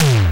SUPER TOM; E-MU Formula 4000 Series Vol. 2; OrbitPercMaster

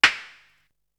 Snare; ZERO G - Datafile DISC 3

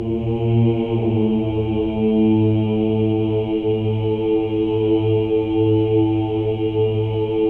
Voices; Spectrasonics; Symphony of Voices; HALF DOWN

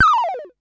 Perc Synth Raw

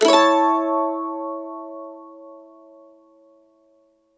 CEE CHORDS A, Blues Guitar Samples

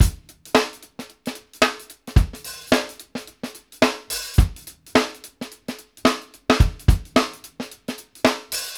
16TH SHUFF, drum; Sampleheads - New York City Drumworks VOL-1

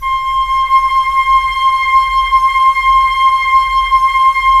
FLT FL, Flute; Roland - LA Composer vol. 4

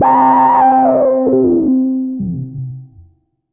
FX, EMS VCS3; Polyend; Simulacrum Sounds; 1 Shots; Synths

